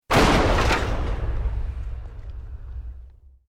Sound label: EXPLOSION MEDIUM WOOD